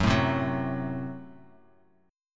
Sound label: F sharp Diminished Bass Standard; F#dim strummed